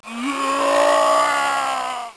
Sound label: monster scream; ambient; revil; monster scream 2